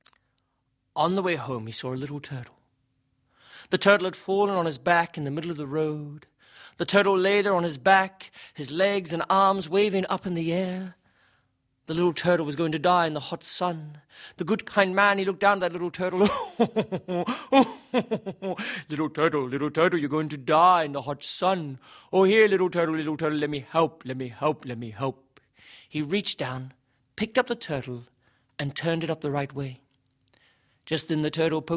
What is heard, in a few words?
singing turtle